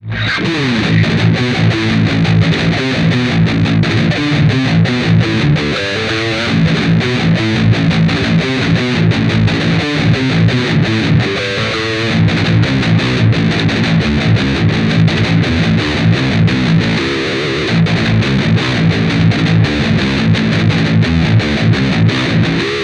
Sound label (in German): Krasser Metal